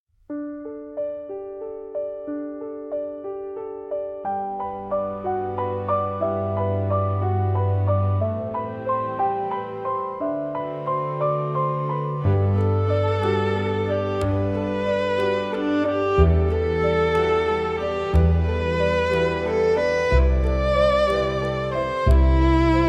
fantasy music nature forest; ghibli style; healing; nostalgia

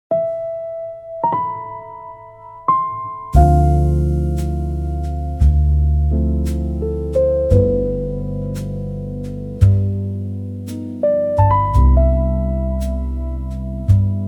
smokey night jazz for night bar

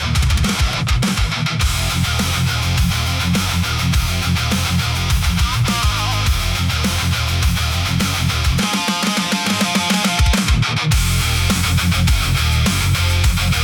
hard rock for powerful

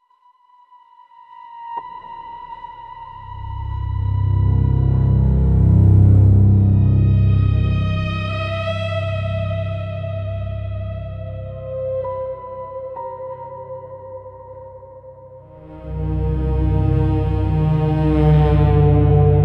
spiritual spooky soundscapes

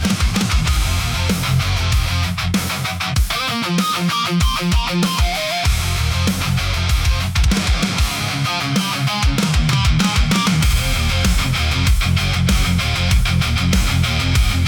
hard rock for powerful

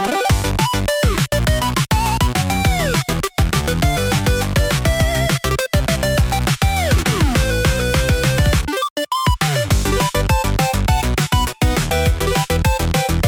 Coin Rush Clear, retro game chip tune; pico pico sound

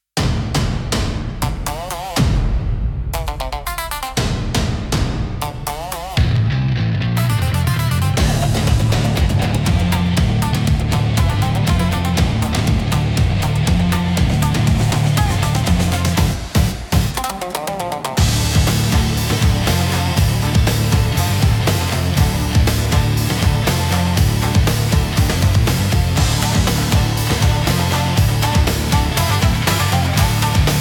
Kage Run, intense japanese rock

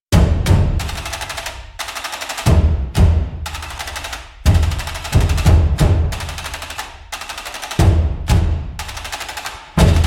Thunder of Ten Thousand Drums, japanese drums; battle rhythm for power tension battle and heartbeat

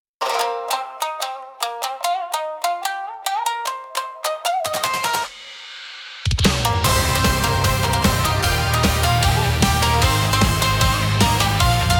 intense japanese rock

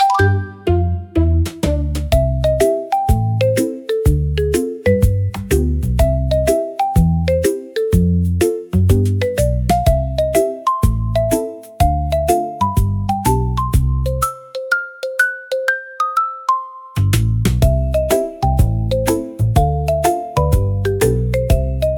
toy piano march for kids pets videos

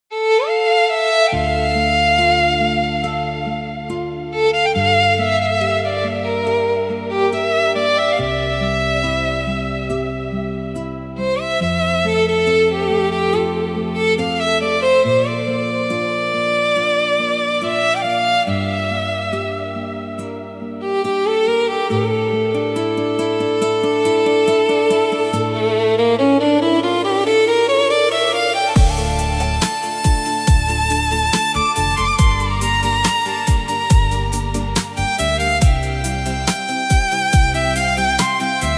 Murmur In Glass, spiritual spooky soundscapes

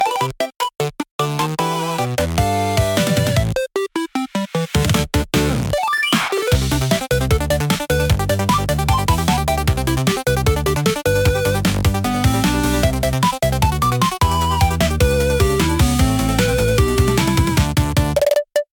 Coin Drop Run, retro game chip tune

